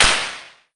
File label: suspend slap; Mamemomi